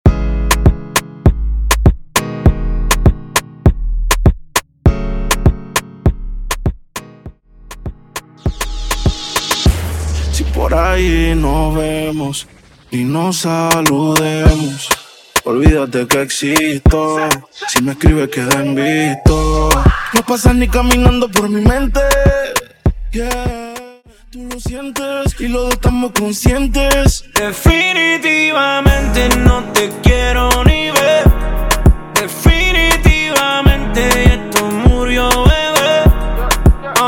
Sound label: Dancehall